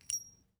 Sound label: raging bull remove